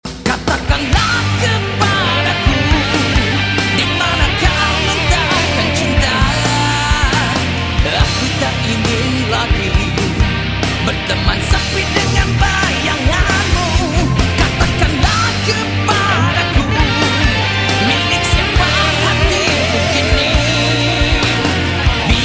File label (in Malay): Lagu Melayu